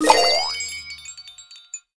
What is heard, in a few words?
SFX - Pang Coin Emit; PangYa Fantasy Golf